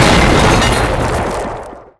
destroy; quake2; inland

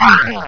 jump; quake2; ontra

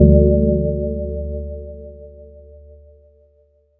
large bell; quake2; jitspoe

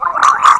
gurp; quake2; austavin

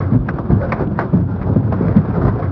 okami mech; quake2; kitsune

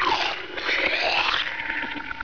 drown; quake2; dalekx

